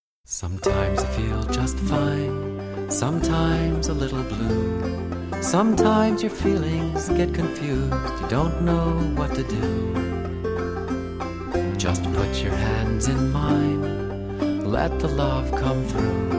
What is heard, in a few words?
blues